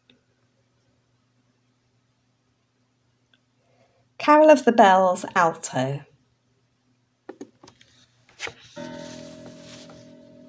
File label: Carol of the Bells Alto, christmas choir